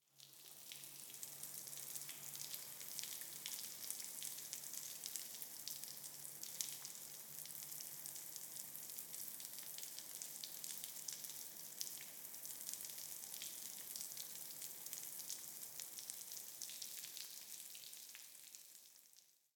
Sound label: Water Spray Drops Streaming Against Cement Water Hose With Light Reverb; Hollywood Edge; sound designer's toolkit v2